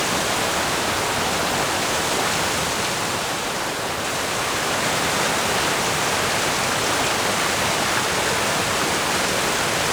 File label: Water Rapids Small Splash Loop; Flowing